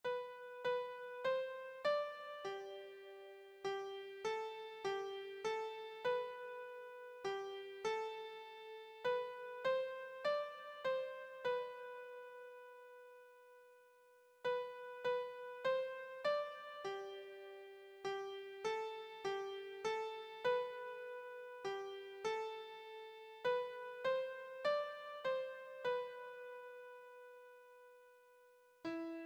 night dark, keyboard

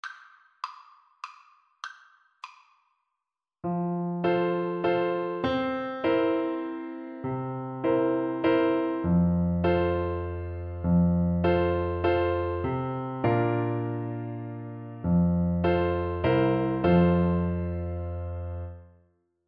happy birthday, bassoon

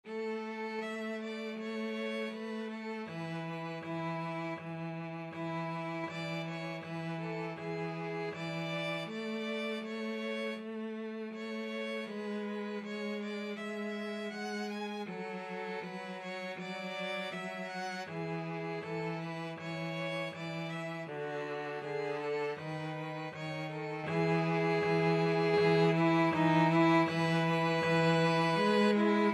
violin cello duet

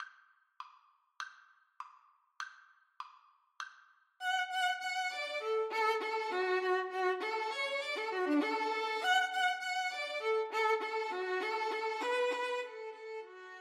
allegro violin duet

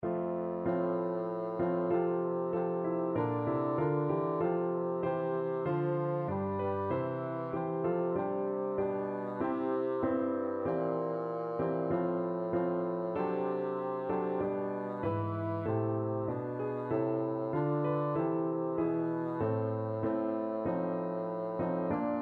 Navy Hymn, bassoon

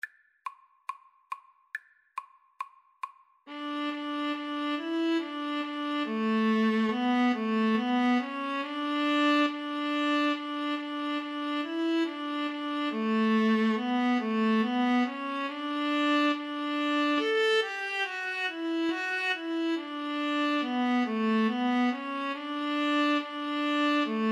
viola duet

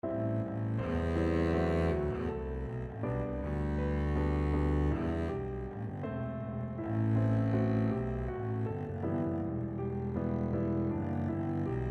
borodin polovtsian double bass

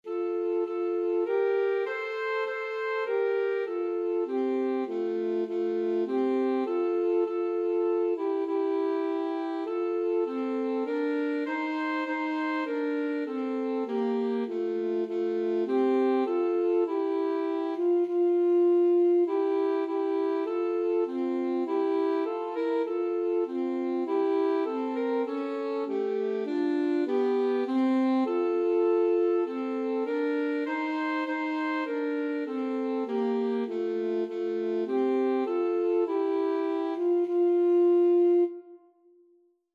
alto saxophone

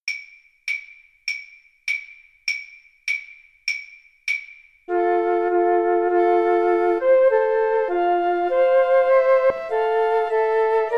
Flute Trio

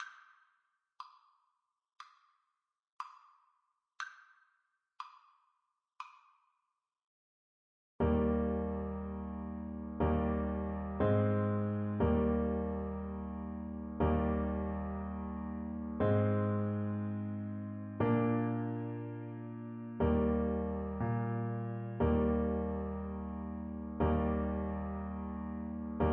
o little town, Saxophone

